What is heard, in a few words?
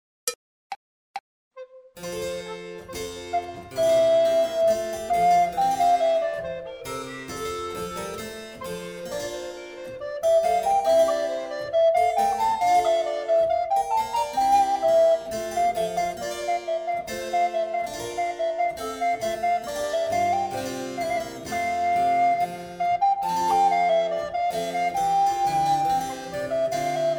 bourree